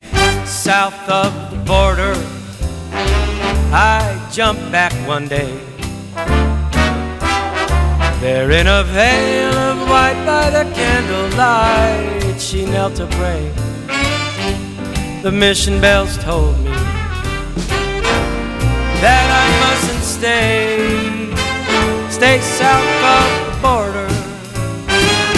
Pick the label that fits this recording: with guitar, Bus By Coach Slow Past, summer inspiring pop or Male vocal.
Male vocal